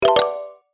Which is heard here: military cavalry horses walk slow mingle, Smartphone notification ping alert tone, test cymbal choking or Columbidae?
Smartphone notification ping alert tone